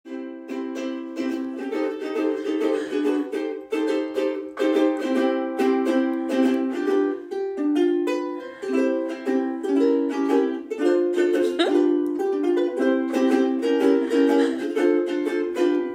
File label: Easter Ukulele Jam